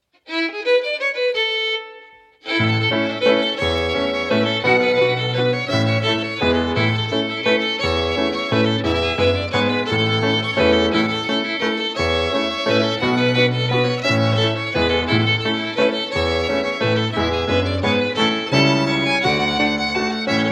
Waltz; Montgomery Bell

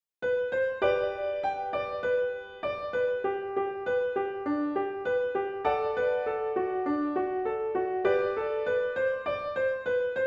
Reel MELODY CHORDS; Dons Birthday Reel

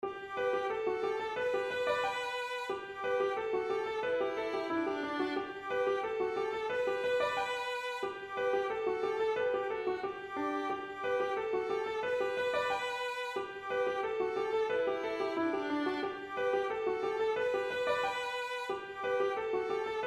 melody harmony; Hommage a Jean Leon

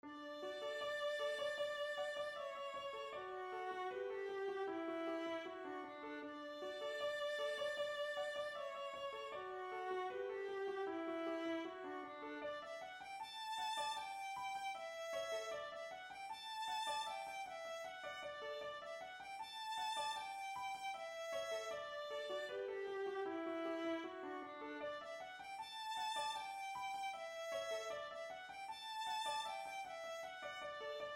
Melody Harmony; Rachael Rae

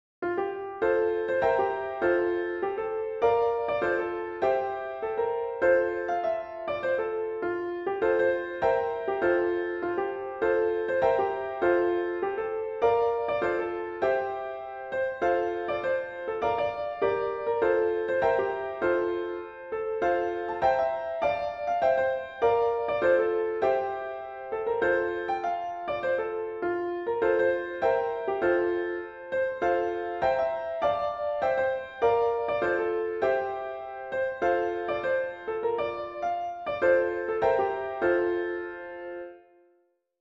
MELODY CHORDS; East B Hill Road

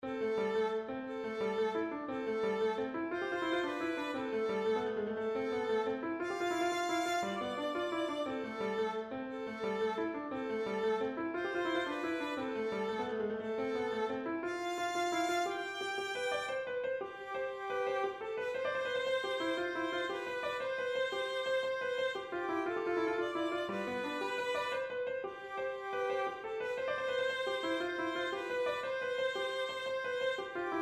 Melody Harmony; Brigid McCabe

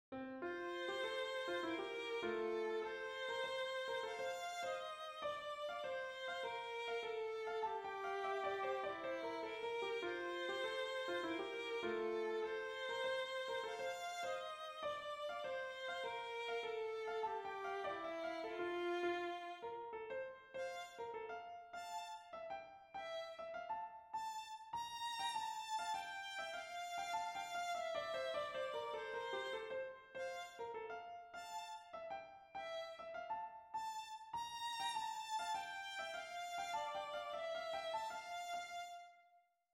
melody harmony; Lass o Corrie Mill

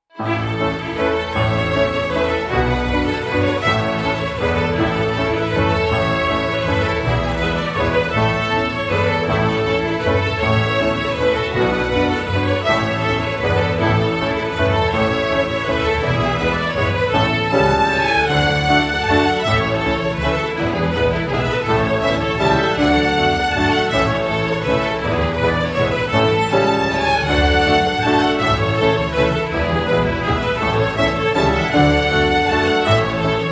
Waltz; Montgomery Bell

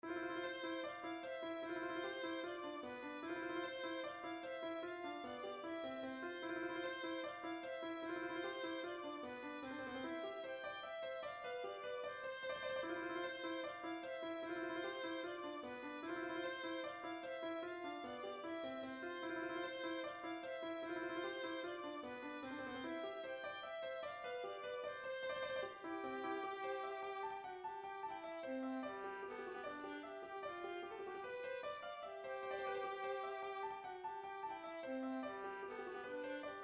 Mandolin Melody and Harmony

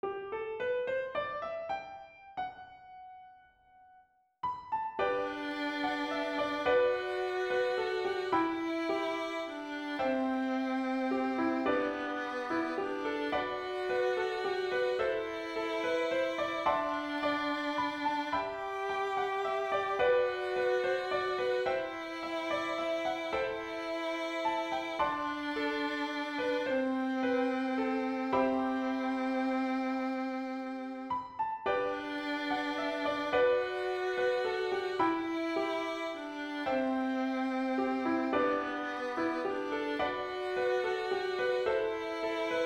waltz

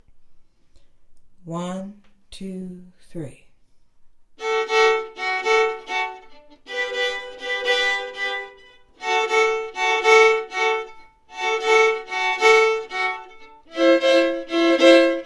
valse seconding slow; Kennebec

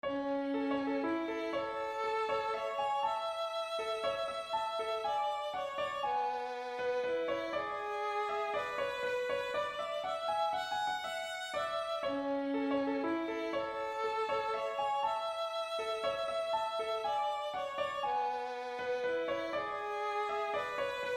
waltz clog mel harm 120bpm

